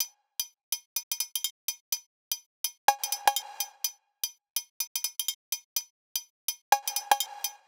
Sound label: CowBl Loop 125; Equipped Music; Loungin House; Perc Loops 125